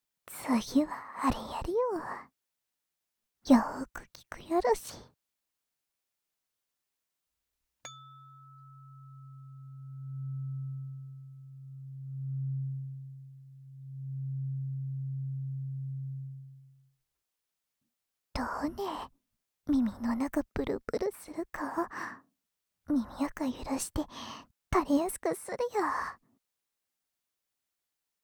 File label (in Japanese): 【左】音叉, ASMR